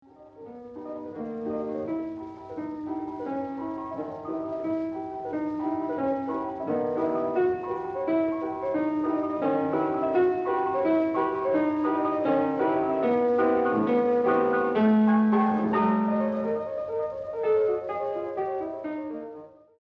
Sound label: damaged 78 piano clean; Restoration